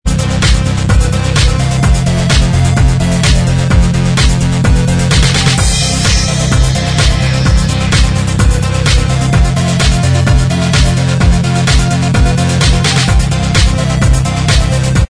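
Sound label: dance remix instrumental track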